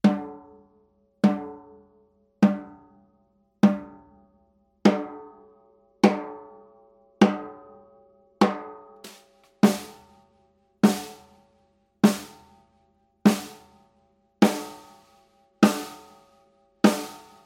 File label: Hoops guss solo, snaredrum; snaredrum spannreifen; snaredrum spannreifen vergleichstest